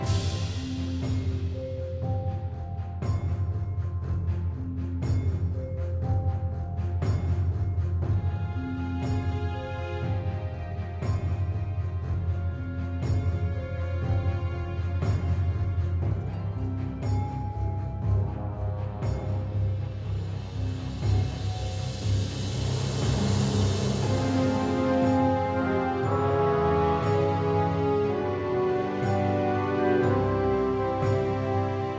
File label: Steampunk, MUSIC; Fate The Cursed King